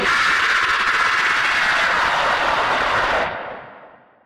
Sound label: screamer